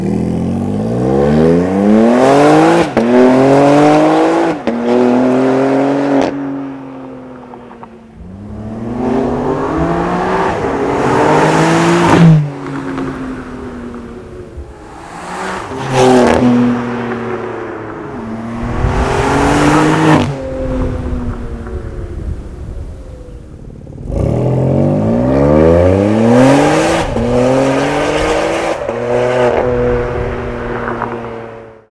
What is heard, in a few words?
M3C SOUND, exhaust